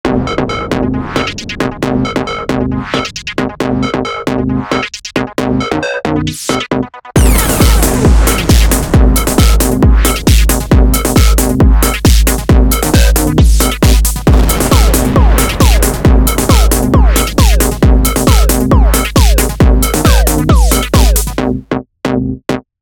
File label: technoparty